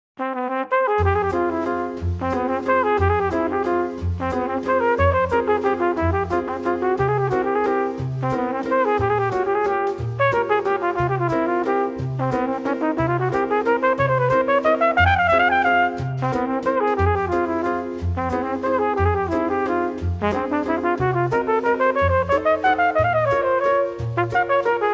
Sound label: Waltz Flugelhorn